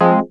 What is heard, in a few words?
wurlitzer, dx7